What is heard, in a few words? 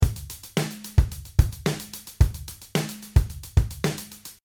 16 beat, drum; drumlesson